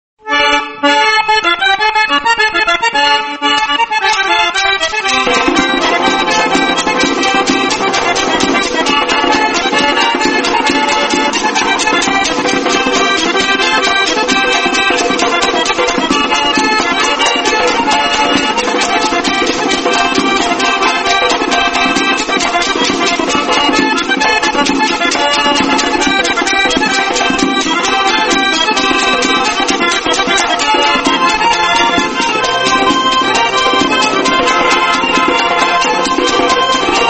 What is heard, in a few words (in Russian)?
Lezginka Adygejskaya